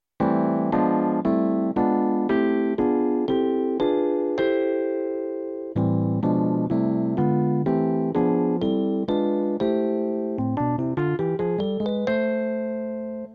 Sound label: block chord, piano